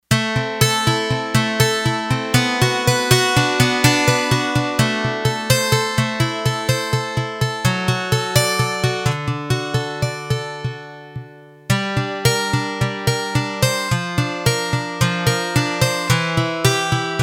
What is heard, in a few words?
Guitar Box, Yamaha TX802; Factory Patches; Voices